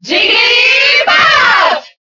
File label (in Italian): Cheer Italian; Jigglypuff; SSBB